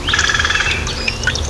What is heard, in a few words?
crow, birdcall